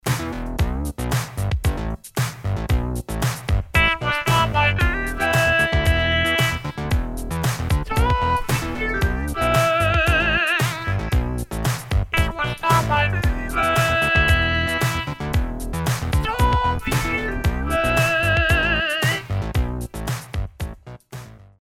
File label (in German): Dunlop MXR M222 Talkbox ueben